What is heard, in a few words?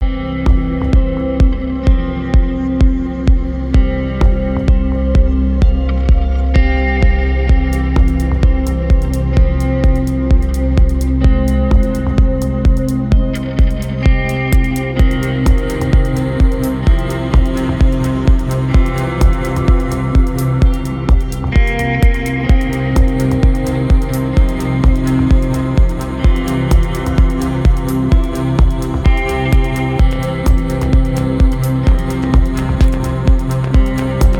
Darker Somewhat Sad Edgy Ghostly Dance; CUES for film TV games etc